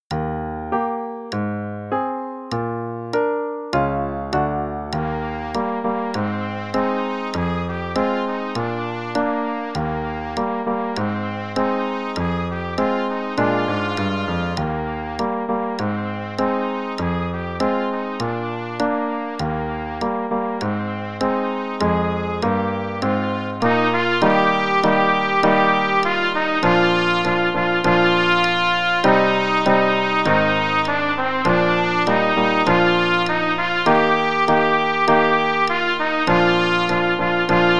Play-a-long (both parts, qn=100); SOE Bk 1 p.20 Sawmill Creek

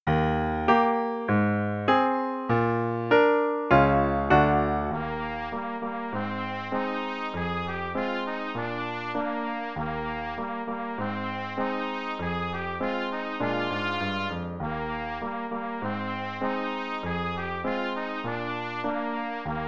Trumpet and Piano, qn=100; classical trumpet; Sawmill Creek

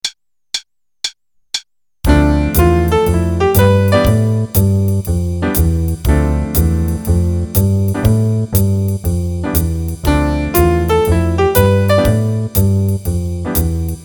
Improv Dmin 32 with Jazz Rhythms; D min7 (D Dorian) (32 bars, qn=120); Trading with Jazz Rhythms, Set 2; Jazz Improv Play-a-Long

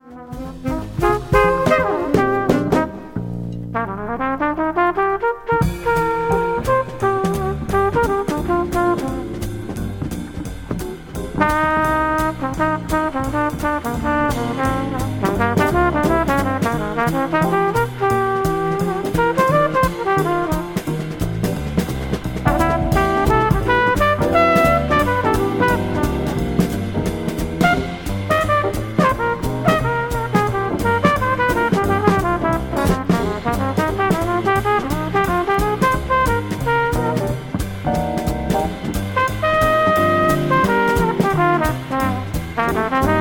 trpt solo only, orig at qn=173, jazz trumpet

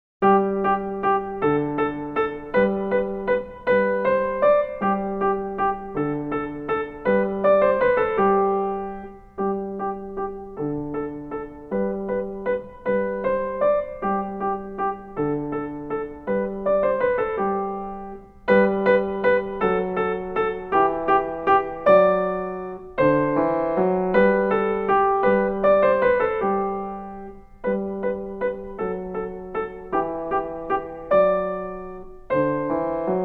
Elegant Dance; Reinagle

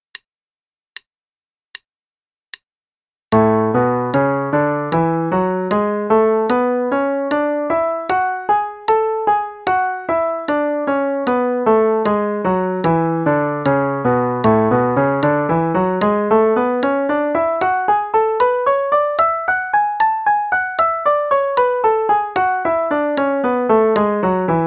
A Major Scale only (qn=76); 8th notes = 2 octaves, and triplets = 3 octaves; CM Level 4; Daily Warm-ups

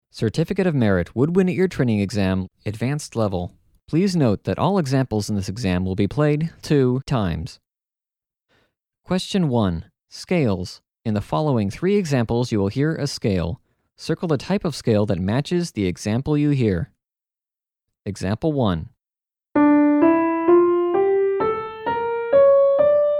Ear Training Wind; Level Adv; Past Musical Knowledge Examinations

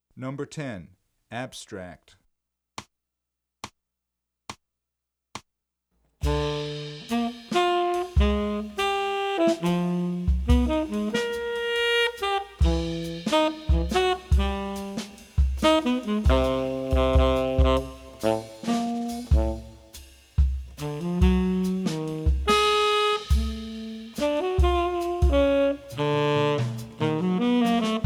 Abstract, trumpet, jazz; 14 Jazz and Funk Etudes; CM Level 8 Solos (jazz)

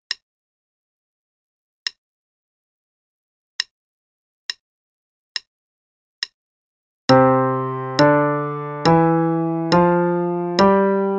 5 Finger Pattern in C and G 2x each (qn=70)

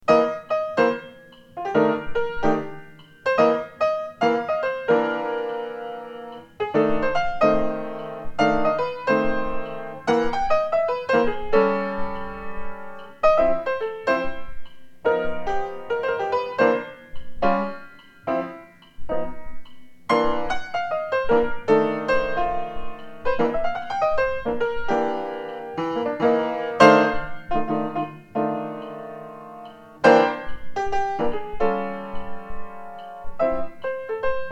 blues Excerpt; C Blues (3 choruses); Blues Solo Excerpt #1

